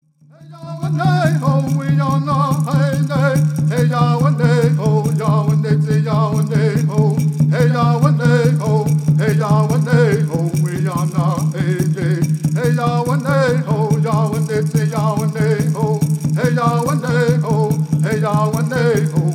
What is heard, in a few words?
Native American Church Song